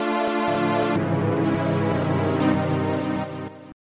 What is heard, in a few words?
dead music